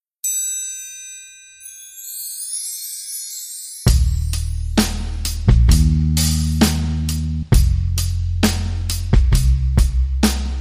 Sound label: DnB